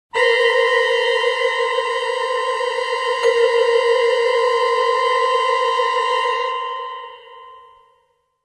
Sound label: Scream